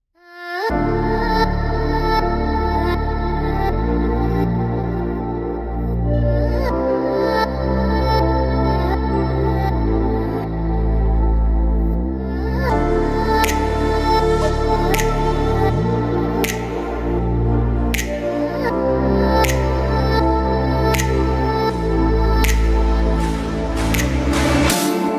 slowed

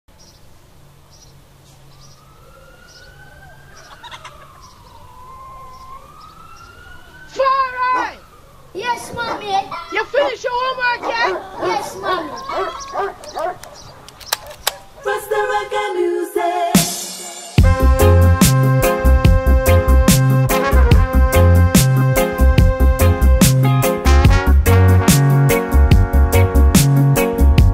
rasta reggae music

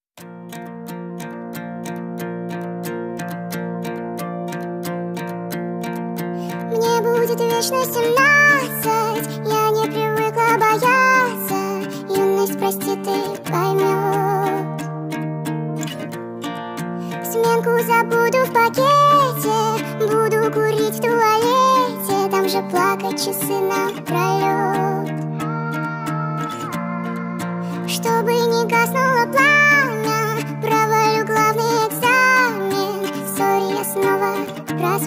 speed up nightcore

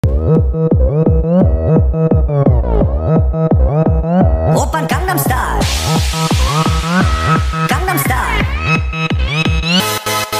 sped up reverb

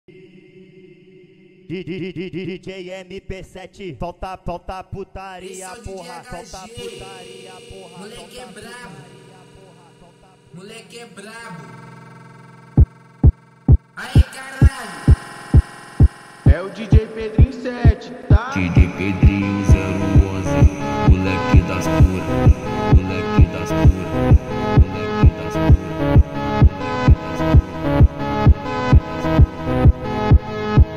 automotivo bass boosted

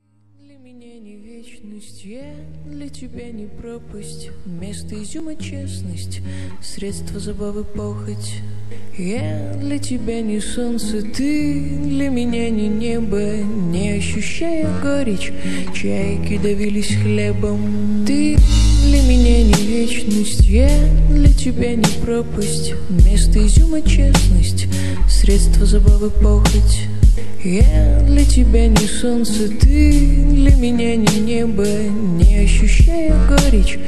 slowed reverb

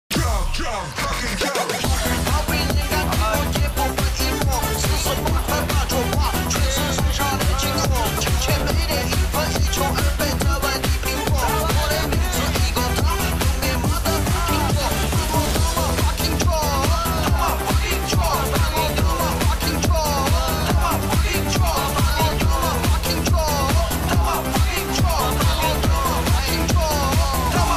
eurobeat remix

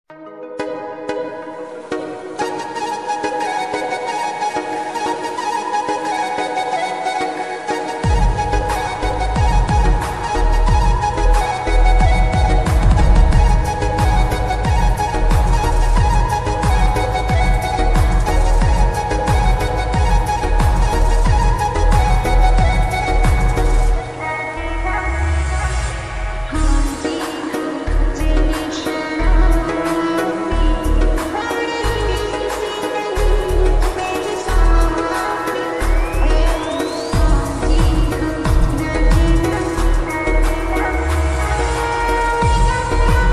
slow reverb remix

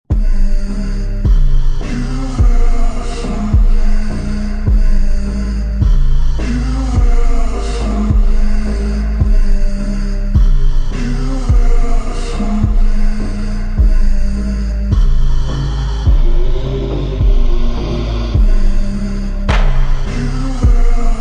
super slowed reverb

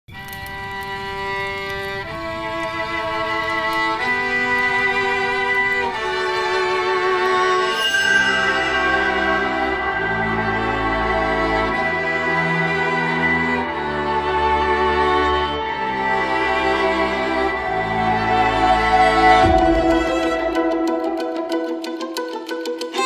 dramatic violin version

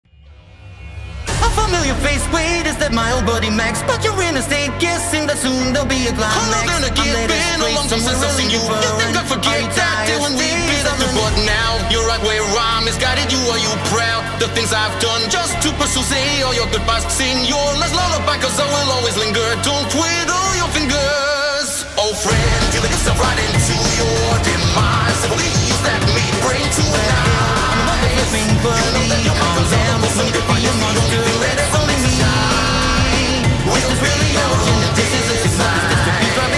synth v cover

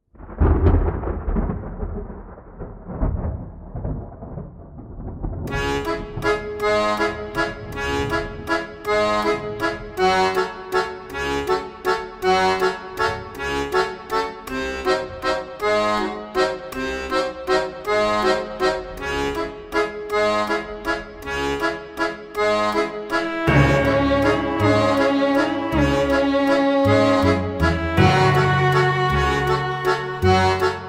dark vampire music waltz music

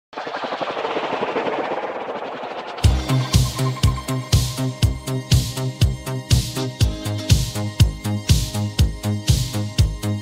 italo disco

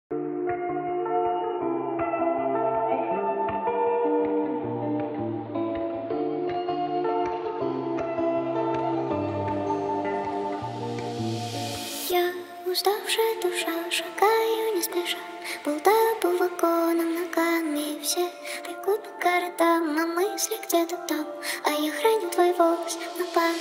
hardstyle remix

